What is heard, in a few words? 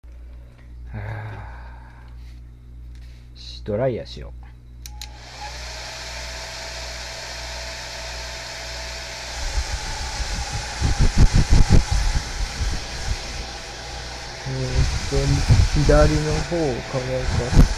dryer